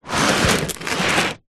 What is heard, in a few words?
foley container tub plastic pull drag scrape concrete light grit